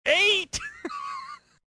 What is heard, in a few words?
giggle